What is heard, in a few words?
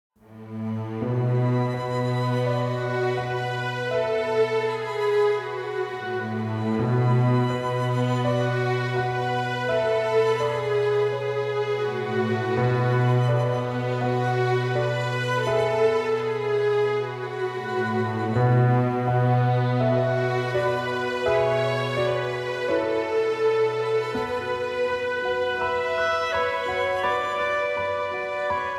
Leaves In The Wind Underscore, Autumn Strings; Narrative Mind